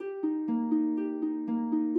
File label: Harp; Arc Apellago